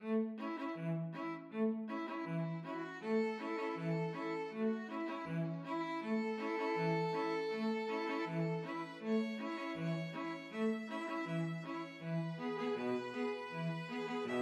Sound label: coming round the mountain, string quartet